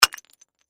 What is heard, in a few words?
Crash Pottery Clay Pot Break Drop; Crashes (Sound Effects:Objects); Blastwave